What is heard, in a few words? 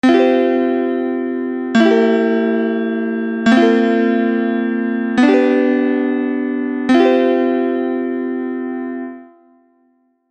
Isus4 - iiiow - IVsus4 - Vsaj